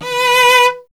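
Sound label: strings cello B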